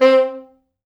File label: C3, Pop Brass; Best Service ProSamples vol.19; AKAI